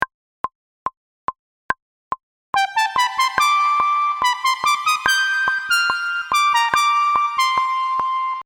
Lead

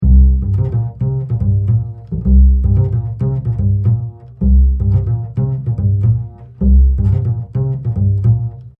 Bass

